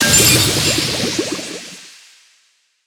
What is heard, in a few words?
Witchs Cauldron Play